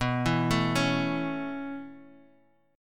B 7th Suspended 2nd Suspended 4th, down, Guitar, Open F; B7sus2sus4; arpeggiated